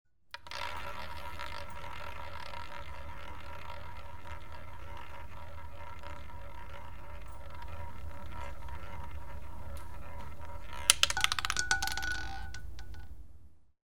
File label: Roulette - wheel spinning with small ball; The BBC Sound Effects Library; Sport and Leisure